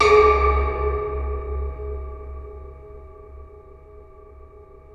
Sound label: space bass J; InVision Interactive; Lightware VOL-1; Instruments & Percussions; DEMBY